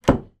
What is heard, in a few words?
household airing cupboard door close